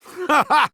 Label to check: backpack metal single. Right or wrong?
Wrong